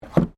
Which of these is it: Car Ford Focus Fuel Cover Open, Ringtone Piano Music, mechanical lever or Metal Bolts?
Car Ford Focus Fuel Cover Open